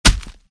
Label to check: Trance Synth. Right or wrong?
Wrong